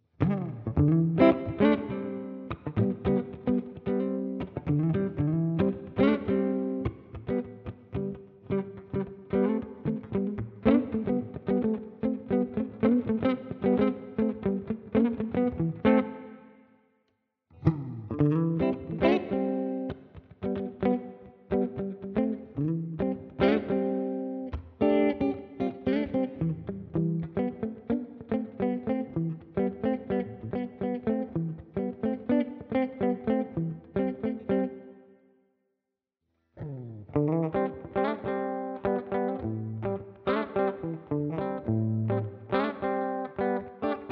all pickup positions riff groove

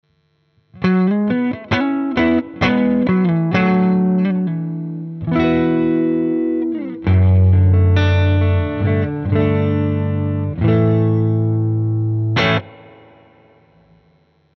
Fender Deluxe Spring Rev

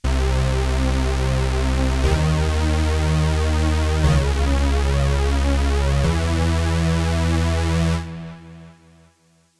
Roland Cloud Juno-106

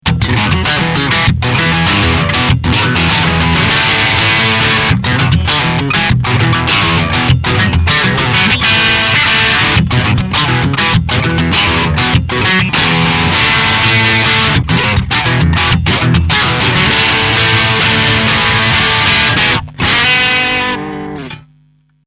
Heavy Crunch

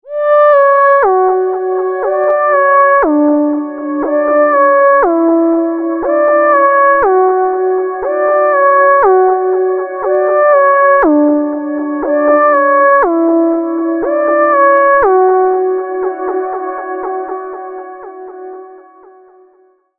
Leadsynth

